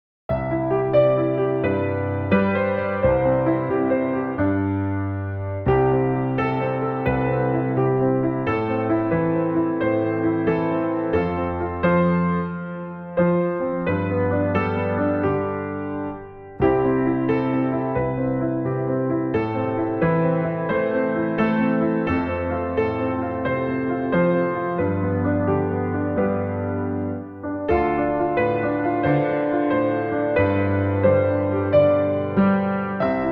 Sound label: 88 BPM in C No Vox